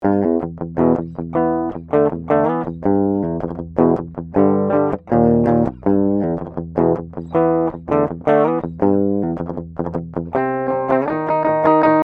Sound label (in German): Riff Idee Ohne Dist